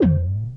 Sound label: BALL; moonchld